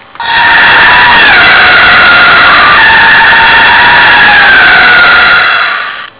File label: f1 music; tirepack